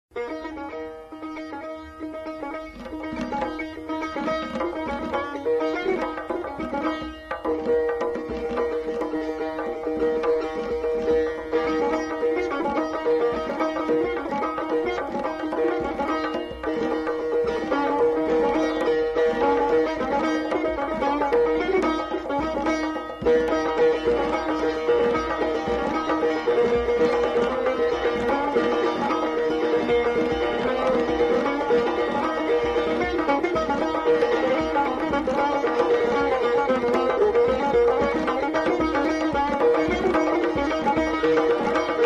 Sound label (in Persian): Chaharmezrabe Tar Va Tonbak